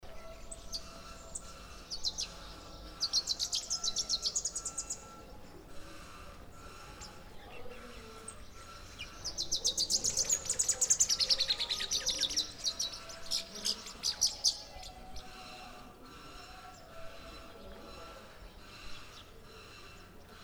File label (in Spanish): jilguero cara gris, Sicalis uropygialis, Bright-rumped Yellow-Finch; cantos